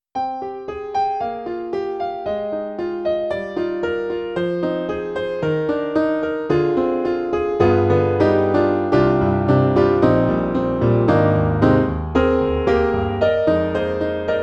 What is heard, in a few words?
Ballad; E-MU; Holy Grail Expansion ROM